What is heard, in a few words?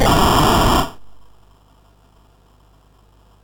sid MOS6581R4; Movie Monster Game